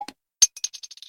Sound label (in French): decapsuleur